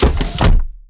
step; labubu; mecha

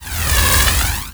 flame thrower fire hit loop; tf2

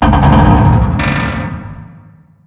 bin creak; Garry's Mod; outland

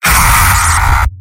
soldier m pain severe; tf2; garrysmod; mvm

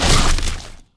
creature hydra attack bite foley

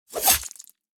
attack, cursed bear; quitoypongo

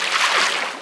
wade, footsteps; Garry's Mod; tf2

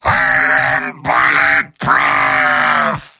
yell; heavy; mvm

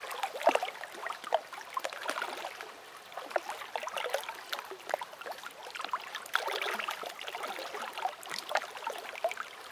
moat, ambient; Garry's Mod; tf2; rottenburg

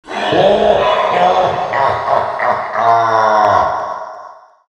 eyeball big laugh, halloween; vo; tf2; garrysmod

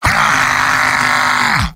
battle cry; heavy; tf2; mvm

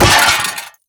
robo impact soft; Garry's Mod; tf2; mvm

